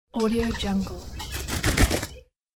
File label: sickle pigeon landing